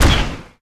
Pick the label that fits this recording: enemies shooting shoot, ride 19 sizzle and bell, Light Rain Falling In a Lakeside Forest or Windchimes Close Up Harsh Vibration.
enemies shooting shoot